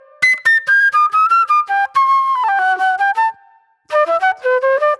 Flute

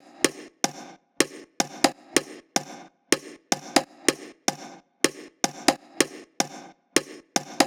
Conga Loop 125; Equipped Music; Loungin House; Perc Loops 125

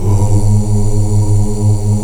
CHOIR OOS G#2; Spectrasonics; Heart of Africa 1

